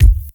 Kicks

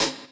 Closed Hats

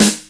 SNR, Snares

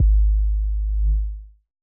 Not Nice 808's

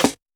double clap rim; Percs

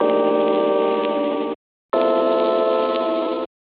Space dust

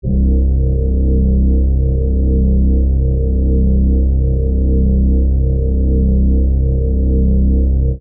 bass synth C

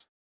Hi Hat, Closed Hats

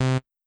Synth Blip

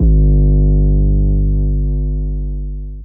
808s

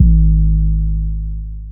(G#) 808

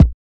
kicks

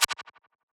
Perc

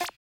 FXs

